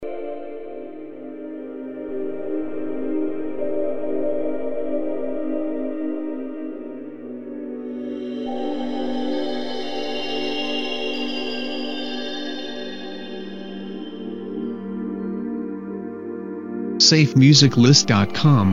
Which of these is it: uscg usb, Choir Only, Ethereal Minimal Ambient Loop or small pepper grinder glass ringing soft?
Ethereal Minimal Ambient Loop